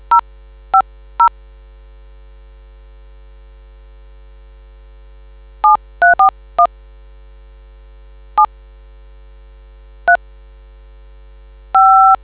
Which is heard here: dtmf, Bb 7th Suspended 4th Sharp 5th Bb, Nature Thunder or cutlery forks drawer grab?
dtmf